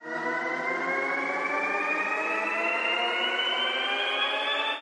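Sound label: respin sound; longpao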